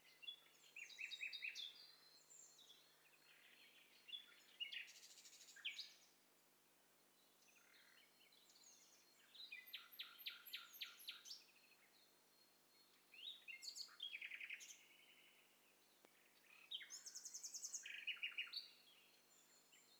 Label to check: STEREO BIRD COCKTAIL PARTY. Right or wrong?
Right